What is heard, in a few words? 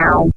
Drums, Hits; DCM8